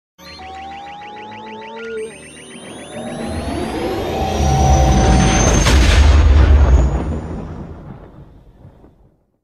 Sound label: millennium falcon hyperdrive effect